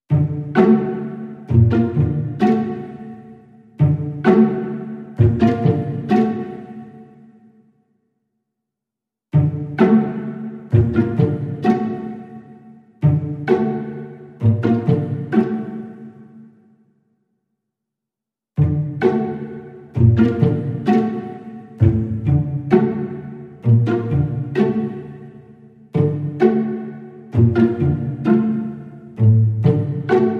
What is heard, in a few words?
Strings Only